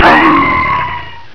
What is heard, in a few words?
death